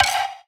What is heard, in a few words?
Misc Percussion